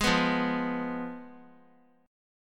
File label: F Diminished, chord; Fdim strummed; JGuitar